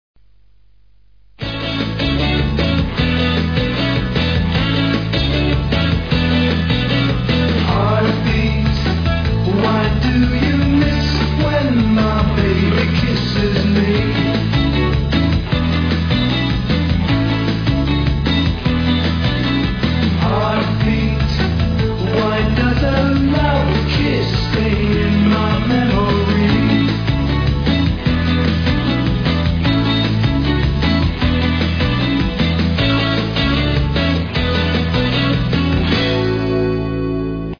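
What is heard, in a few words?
Heartbeat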